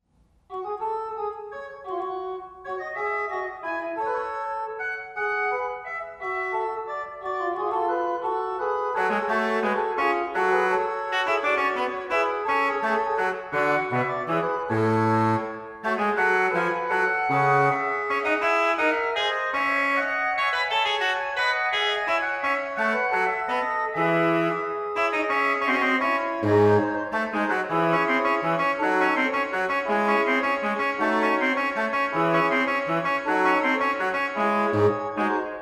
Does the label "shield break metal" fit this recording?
No